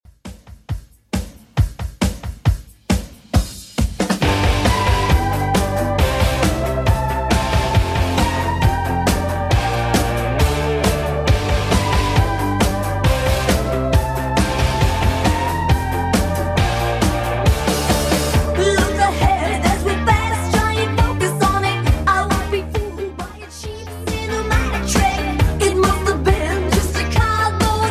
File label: 80s Redrum 136bpm